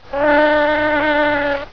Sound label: bee